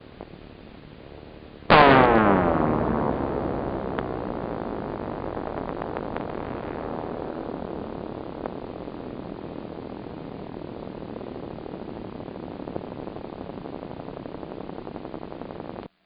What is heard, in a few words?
MYOTONIE percussion